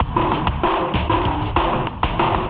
96 far away distorted beat, drum loops and breaks; 091 to 100 bpm

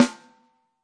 real snare; A Real Freakin Drum Kit

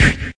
snare, BeatBox Male

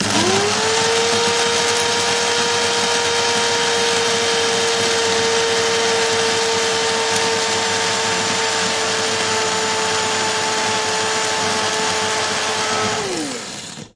Coffee Grind; ModeAudio Found Percussion Hits

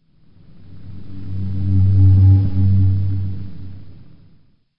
ambient stereo low ghost; Various Strange Sounds and Effects

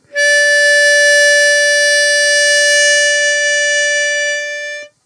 harmonica note; Harmonica Multisampled

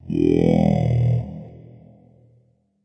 digital growl; Ze Franks Pain Pack

